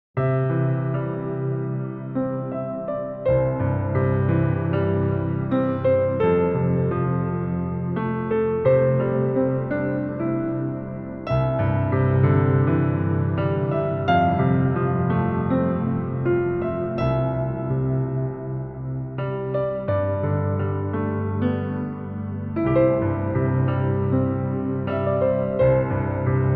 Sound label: Piano Improv; When I Survey